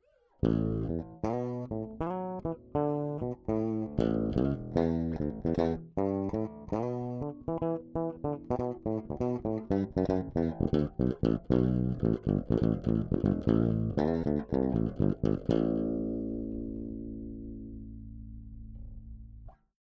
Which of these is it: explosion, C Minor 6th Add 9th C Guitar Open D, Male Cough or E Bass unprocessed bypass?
E Bass unprocessed bypass